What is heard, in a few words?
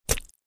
Hitting bloody body